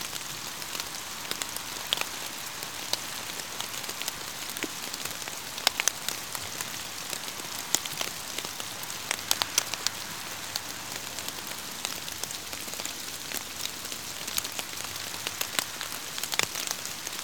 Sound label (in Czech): Les Listy Snezenie